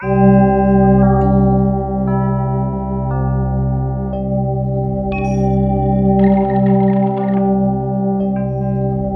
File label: C2, SynthLine; 90 s Sample CDs; NiceBeats; SynthLine VOL-17